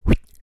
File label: Vanishing